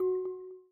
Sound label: button click; fireflies